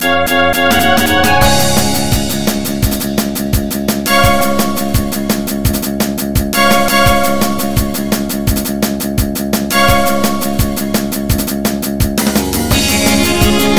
Trance Remix; FL Studio

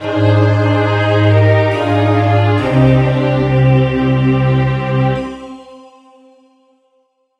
Death

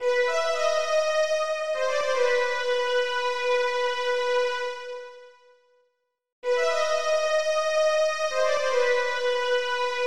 Violin

